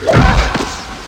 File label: Gragh; Zero-G Jungle Warfare 1, 2 & 3